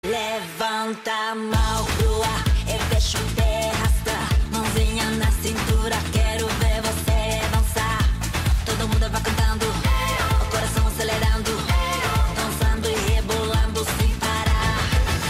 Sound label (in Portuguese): Samba